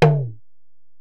Ac Perc, Drum Machines Alesis DM-PRO

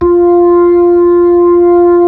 B-3 Club Xfade, Keyboards of The 60's and 70's

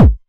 909 Kick, Drum Machines

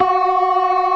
CLASSIC C3, Synth and Organ; InVision Interactive; Keith Emerson; The Most Dangerous Synth and Organ; CLASSIC C3 4

